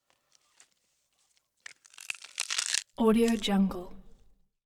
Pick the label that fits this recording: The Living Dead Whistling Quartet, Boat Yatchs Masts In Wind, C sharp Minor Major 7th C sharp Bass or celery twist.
celery twist